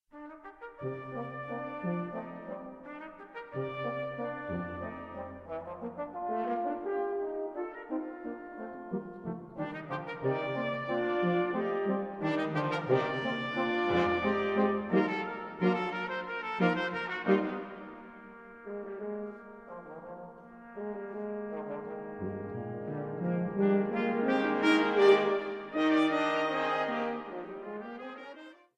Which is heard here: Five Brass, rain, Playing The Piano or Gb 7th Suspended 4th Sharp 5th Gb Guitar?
Five Brass